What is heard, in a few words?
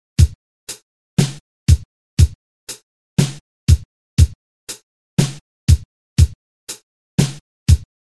heart beat